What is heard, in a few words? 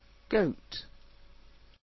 goat